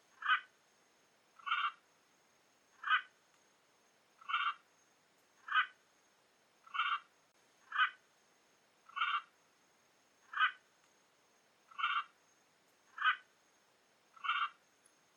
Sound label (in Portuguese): Canto da Arara catalina